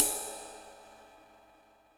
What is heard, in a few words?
Rider, Yamaha DTXpress Cymbal